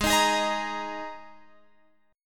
Ab Suspended 2nd chord, Guitar, Open F tuning; Absus2; strummed; chordshape

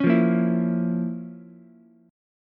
F Major 7th Suspended 4th Sharp 5th down Ukulele; FM7sus4#5 strummed; JGuitar

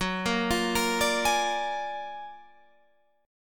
Gb Augmented Major 9th Guitar Half Step Down

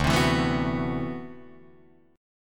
D 13th D, down, Guitar, Drop D

